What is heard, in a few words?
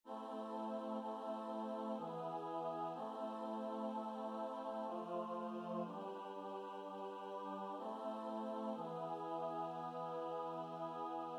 Coventry Carol SATB